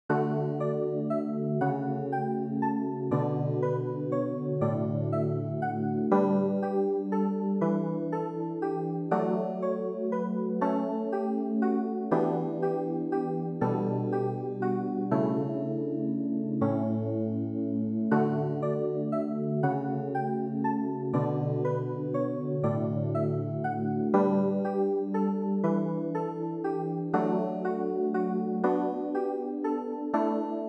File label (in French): CLAVIER